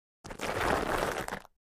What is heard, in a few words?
Object Dragged Acros, rocks & dirt